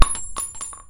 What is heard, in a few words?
spread gun casing